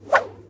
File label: Fishing stick throw